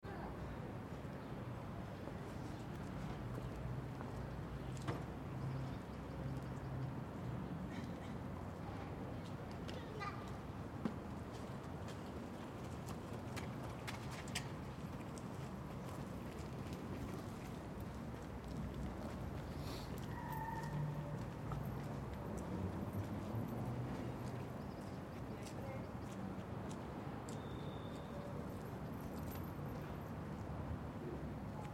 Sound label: Back Yard Children Swedish Mother, Residential back yard with distant city.; Swedish voices of mother and children with a feeling of echo between house wall; City Sound Effects